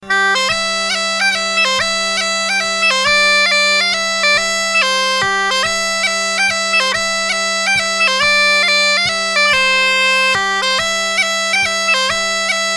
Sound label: mazurka